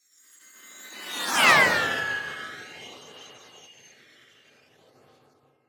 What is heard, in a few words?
Jet By Processed - Passing Space Ship - Thin Jet; Hollywood Edge; sound designer's toolkit v2